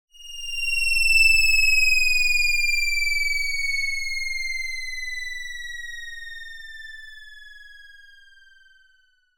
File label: sound design descend fall through space